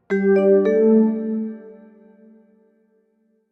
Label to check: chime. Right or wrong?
Right